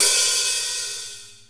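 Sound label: Open Hi Hat; Zoom RT-234; Kit A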